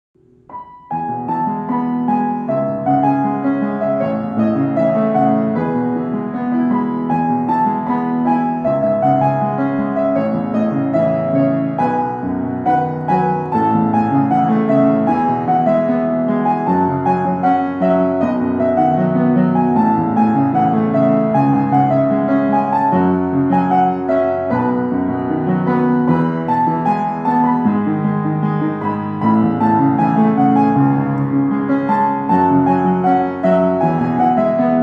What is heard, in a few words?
Instrumental; Moana Waialiki